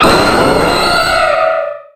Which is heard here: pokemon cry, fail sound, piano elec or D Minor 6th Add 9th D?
pokemon cry